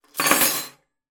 household cutlery handful down on table; zapsplat

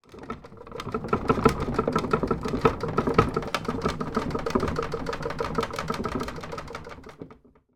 household flat screen tv shake; zapsplat

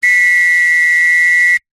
whistle two tone single long blow close up

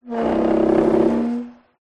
foley plastic thin object slide table vibrate rub; zapsplat

